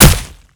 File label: Projectile Sounds; Red Orchestra Ostfront 41-45